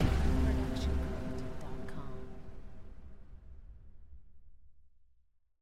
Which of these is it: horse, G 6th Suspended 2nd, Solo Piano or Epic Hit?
Epic Hit